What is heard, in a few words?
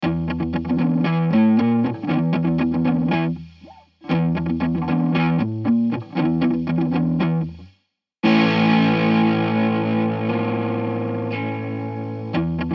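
Em 117 fuzz guitar riff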